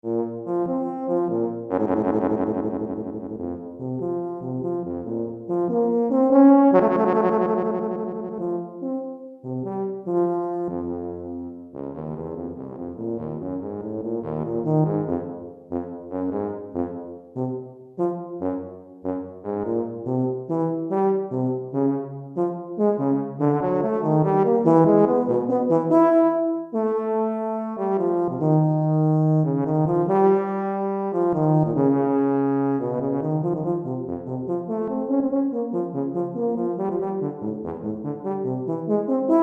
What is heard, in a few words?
Tuba, Pour tuba solo; saxhorn basse; euphonium